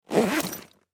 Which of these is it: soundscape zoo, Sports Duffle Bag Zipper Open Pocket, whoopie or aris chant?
Sports Duffle Bag Zipper Open Pocket